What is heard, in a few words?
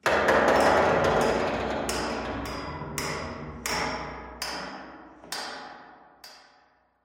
ambient pipe bang, metal